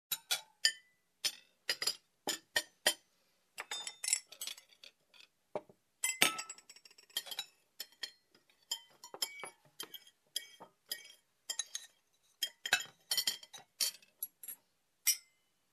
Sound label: Plates and Cutlery clink while dining